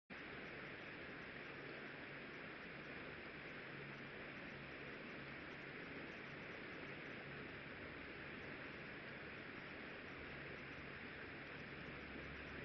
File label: riverside; Kyoto U; Ashiu